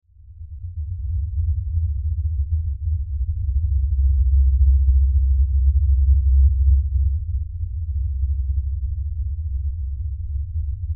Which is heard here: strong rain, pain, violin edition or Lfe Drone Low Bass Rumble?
Lfe Drone Low Bass Rumble